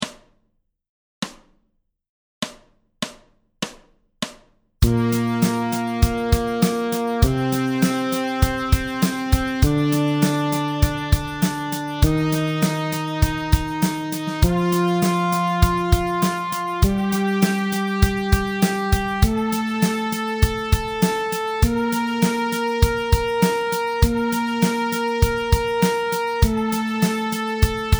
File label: Bb Major Scale Medium